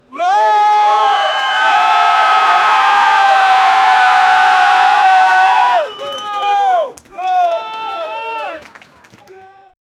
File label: several japanese people s, martial arts